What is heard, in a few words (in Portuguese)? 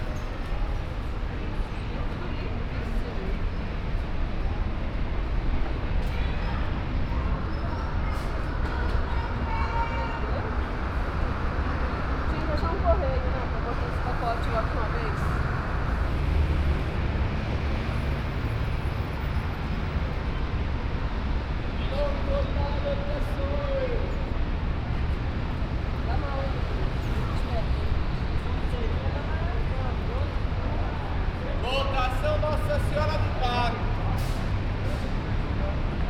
Na Rua, Fieldrecordings Brasilien